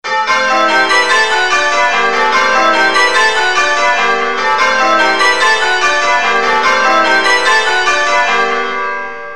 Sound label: Whittingtons, changeringing